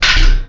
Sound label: Eject Start